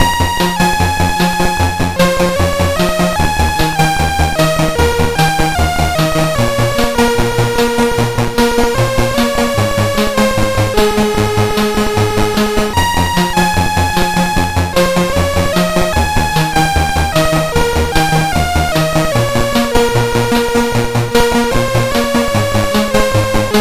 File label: Synth Sample III, CSG8580R5